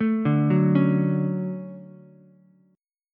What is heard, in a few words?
D-Major 7th, D, Ukulele; arpeggiated; JGuitar